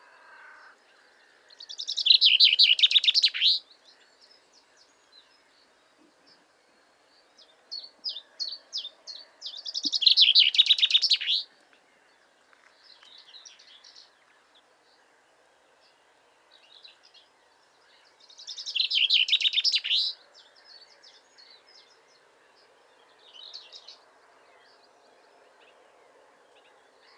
Pinson des arbres Fringilla coelebs

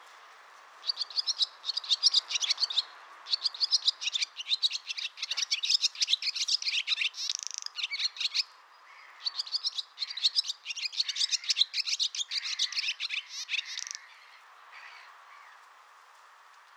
Hirondelle rustique Hirundo rustica

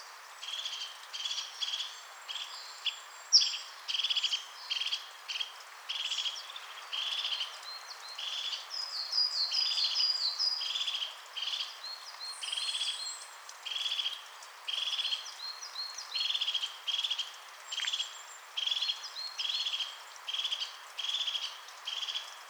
Mésange huppée Lophophanes cristatus

